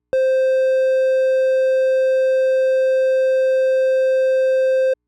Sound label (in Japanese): ノコギリ波